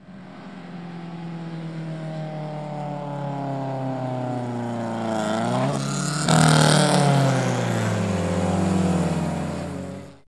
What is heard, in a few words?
SFX CAR; AMG - Now CD-ROM (Roland); Art of Samplng